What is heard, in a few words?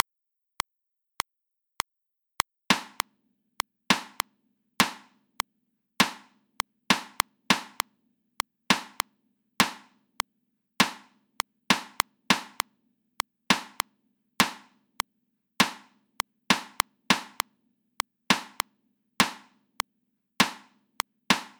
reversed rhythms at 100 bpm